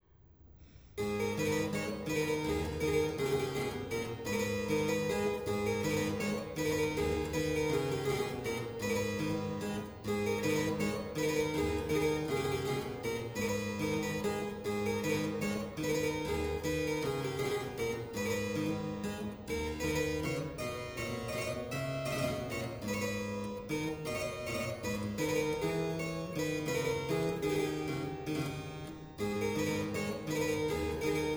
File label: menuet; dela guerre